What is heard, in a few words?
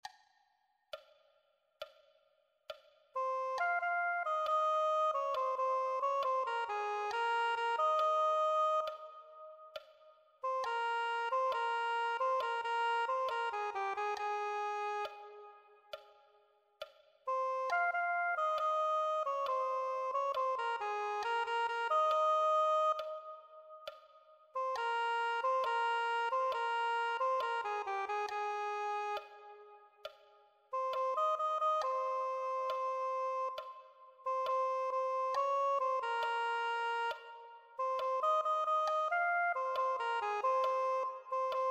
soprano saxophone